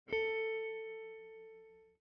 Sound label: B high